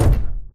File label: Footstep Jog Mid; Titanfall 2